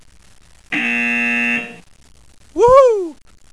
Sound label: buzzer, icehockey